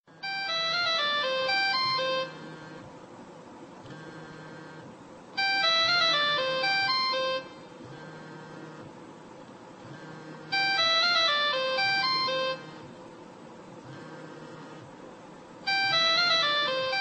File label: satellite phone; jurassic park 3